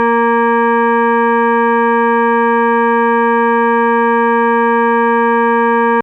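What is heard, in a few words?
FM Sawtooth DX100 A#3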